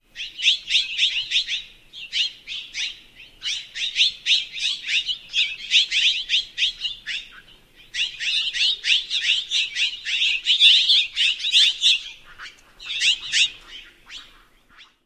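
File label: group calling, Mexican jay; AZ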